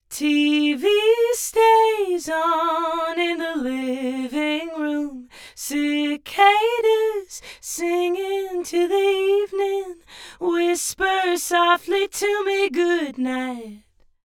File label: vocal dual mono 20ms delay on right, Right side delayed by 20ms; Haas effect